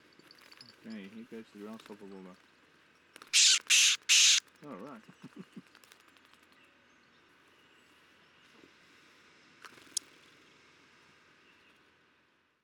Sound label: Common Grasshopper Warbler Calls While In The Hand And After Released